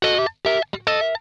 PLUK, Funk Guitar; 90 s Sample CDs; Zero G

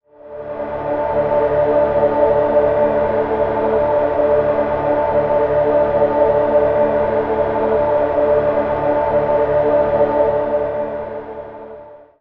Vocal Mist pad D, Ambient Pads; Zero-G Seismic Frequencies; 90 s Sample CDs

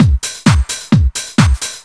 rhythm doctor, Deep House 130bpm

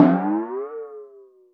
timp; musicradar; cartoon caper

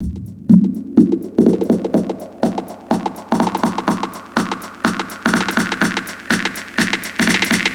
Percussion, Trance NRG, Drums

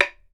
BAMBOO, World Assortment

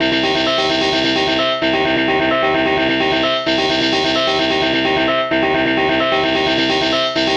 130 Cm synth, Synth Loops, Trance

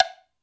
Md Wood, Alesis D4 drum machine

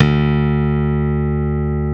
D1 LONG, STIK BASS; D-STIK BASS1

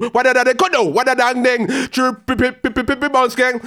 ragga in B phrase

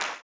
CLAP, blues & soul; ILIO; Vocal Planet

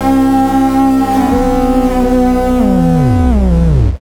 Bass FX

